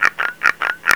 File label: frog